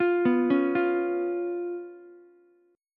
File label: B Suspended 2nd Flat 5th B down Ukulele; arpeggiated; JGuitar